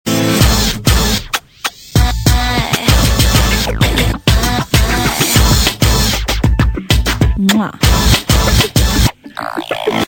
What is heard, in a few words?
Kiss Kiss; ringtones; funny